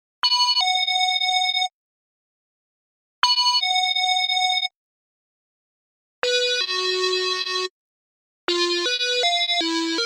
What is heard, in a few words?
Synth